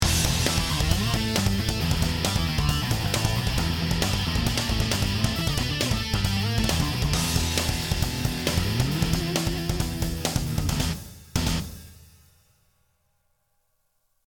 exciter snare

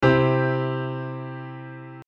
piano Velo

